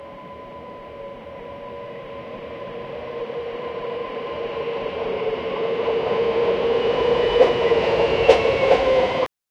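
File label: stopping of electric monorail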